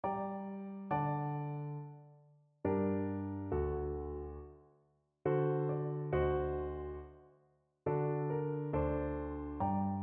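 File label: summer 1st movement four seasons piano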